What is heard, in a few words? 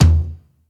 TOM; osu